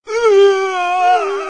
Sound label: uah